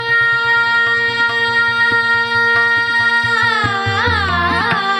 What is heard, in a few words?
Shivranjani, hindustani raag